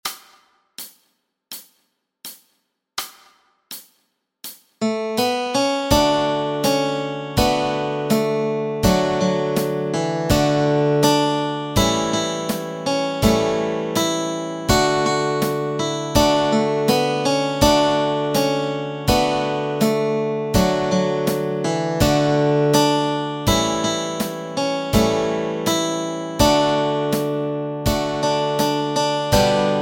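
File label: melody and chords, Easy Guitar and Ukulele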